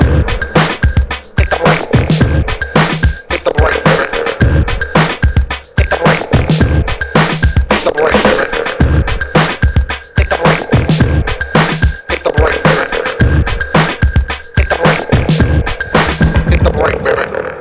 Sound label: HIT THE BREAK, 109 bpm; POTBreaks